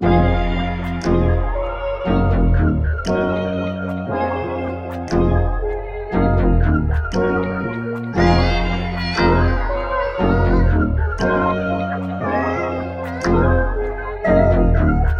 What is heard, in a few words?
118 BPM A# Min, Trap; Purple Moonlight; Cymatics; Destiny Premium Melodies (BETA)